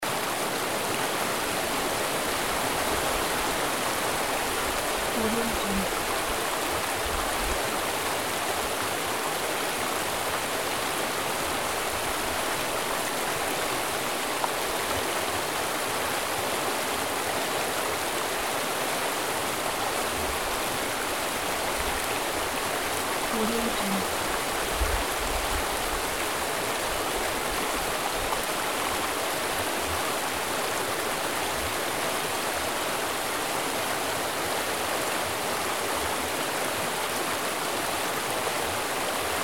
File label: waterfall